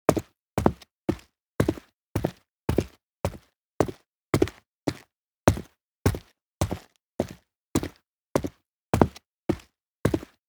walk

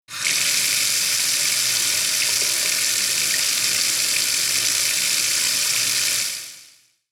shower on

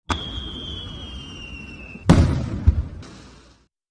uchiage h

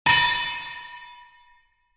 gong

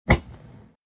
robot

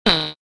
button

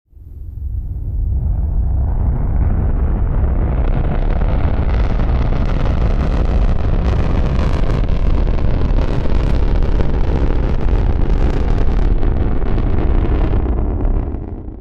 rocket

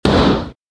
dageki he

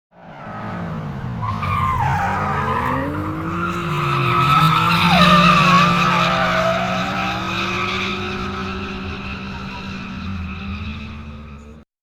car drift

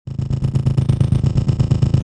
katakata

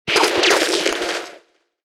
water

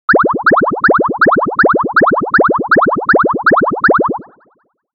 fish buku

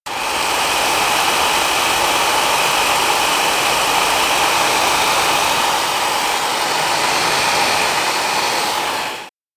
dryer fan

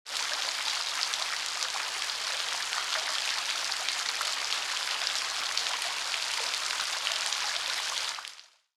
rain

